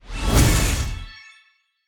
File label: intro, jackpot; wbg games